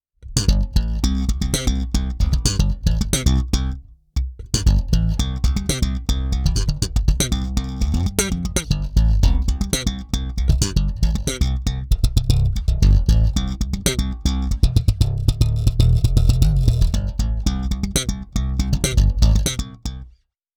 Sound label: Bass Guitar; Reference; Audio Loudspeaker Quality